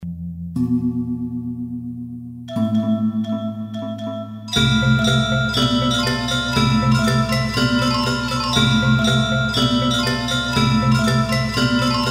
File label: gamelan